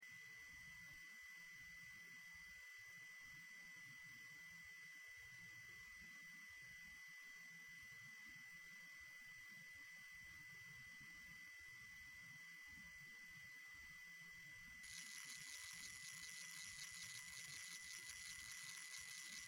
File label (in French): western velociraptor